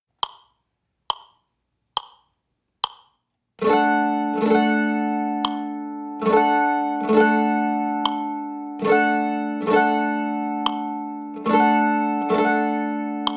Waltz Chop, Mandolin

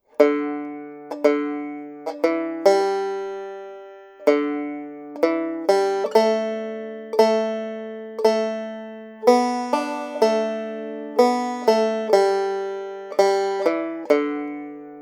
Melody; Handsome Molly

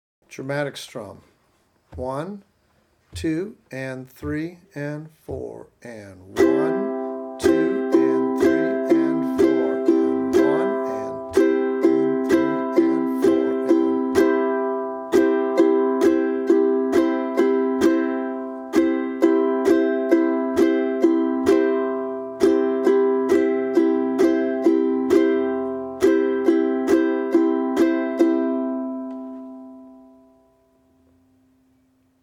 Dramatic Strum

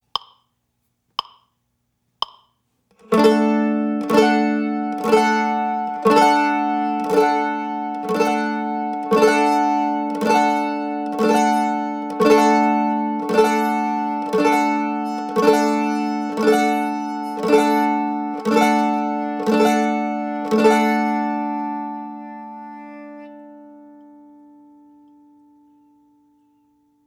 Simple Waltz Strum, Mandolin

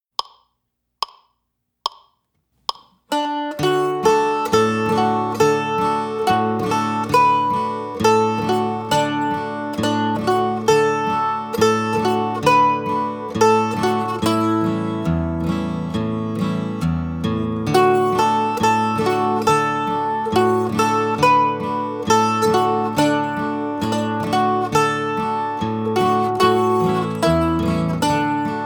Mandolin; Old Gospel Ship; Easy 2-Chord Songs for Mandolin

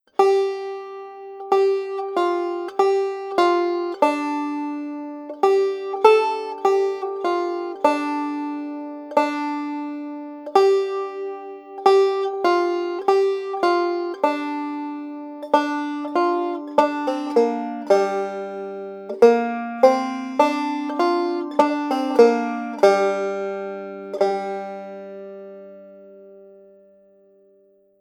Melody; Muleys Daughter

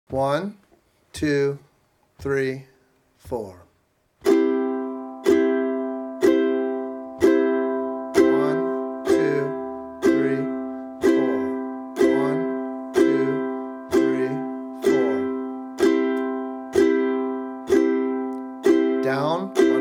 Simple Strum

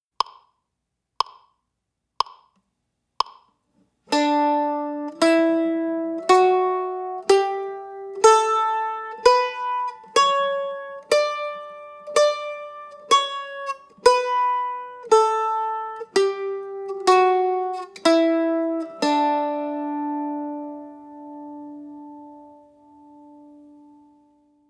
D Scale, Mandolin

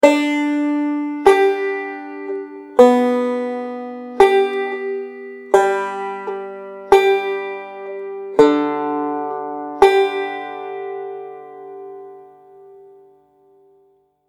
Thumb Roll

